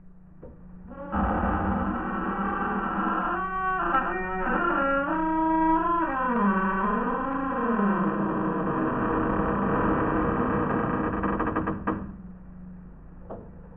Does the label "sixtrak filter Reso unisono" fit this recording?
No